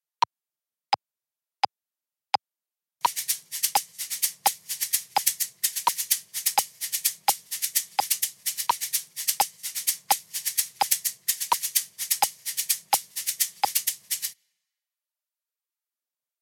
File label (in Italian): Accenti in 12 8; shakers